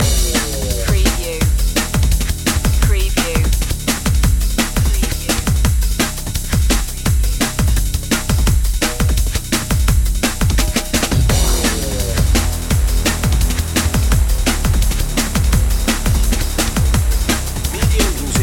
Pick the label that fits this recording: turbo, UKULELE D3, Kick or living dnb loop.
living dnb loop